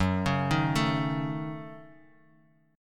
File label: Gb Minor 6th, Guitar; arpeggiated; JGuitar